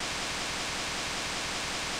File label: Instrument